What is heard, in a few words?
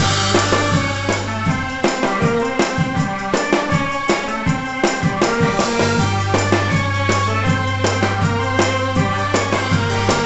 Drums Bridge; monster lab; nds; Music Spirit Drums Bridge V1